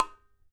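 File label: KHOL HEAD, DRUMS OF IND; AKAI S6000 CD-ROM; WorldSounds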